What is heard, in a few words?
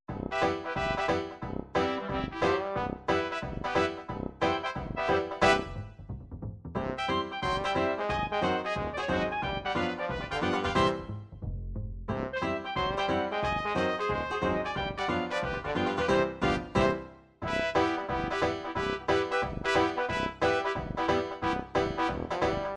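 jazz dixieland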